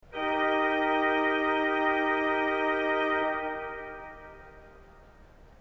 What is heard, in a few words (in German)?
ton, orgel